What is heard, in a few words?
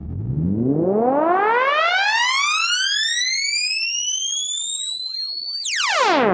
Riser